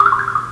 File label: TEMPLE B